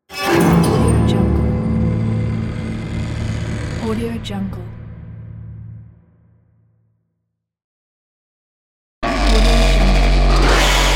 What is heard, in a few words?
horror atmosphere